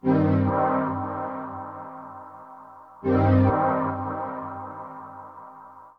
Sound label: synth pad ambient 160 C; Sphere